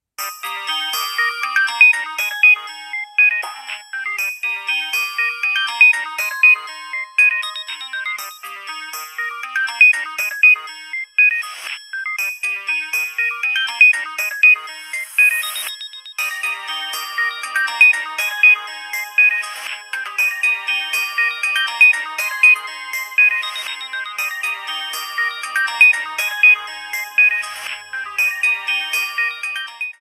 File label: ringtone